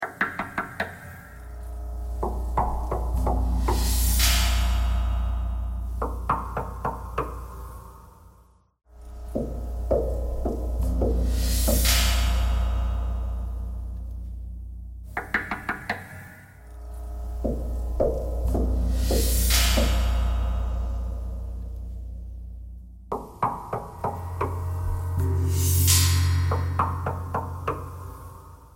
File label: knocking thread noise